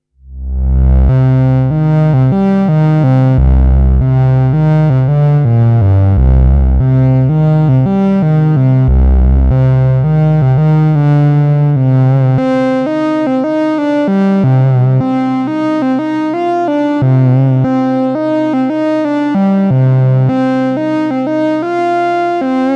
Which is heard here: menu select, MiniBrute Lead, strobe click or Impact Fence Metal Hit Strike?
MiniBrute Lead